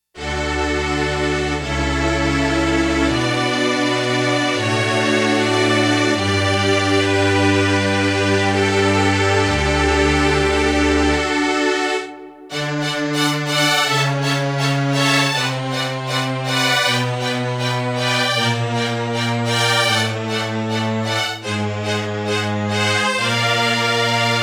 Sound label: STRINGS, Roland JX-1; Factory presets